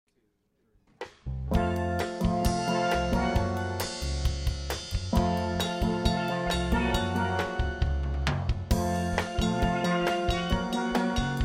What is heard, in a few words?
Bassa Nova Jazz